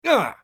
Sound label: bear wound; eft